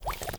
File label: watergun cast